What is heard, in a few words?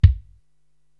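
bass drum Pillow, Korg TR-Rack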